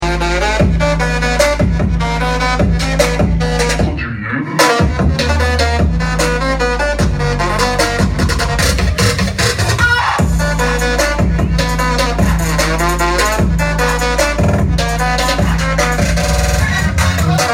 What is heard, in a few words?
Disco